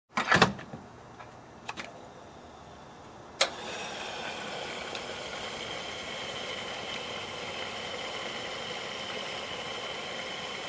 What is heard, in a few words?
Fixing a water tank